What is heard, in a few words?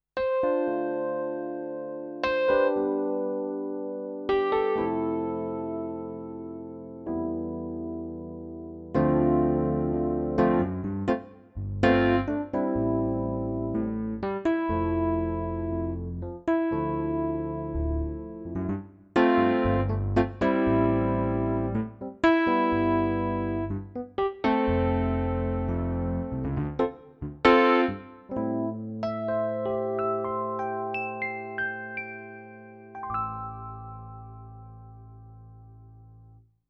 EPiano